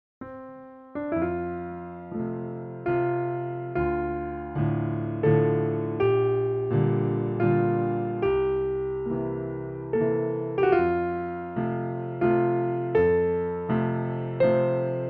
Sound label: Candlelight Waltz, piano